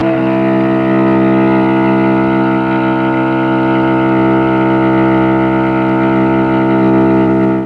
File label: C2 mellotron cello